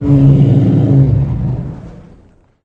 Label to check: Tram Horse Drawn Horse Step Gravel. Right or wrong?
Wrong